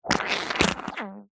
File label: cartoon squelch